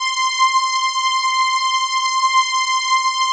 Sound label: FAT Prophet 10; Greytsounds VOL-1